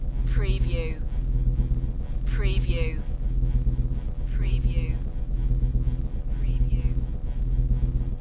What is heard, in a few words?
The Reactor Loop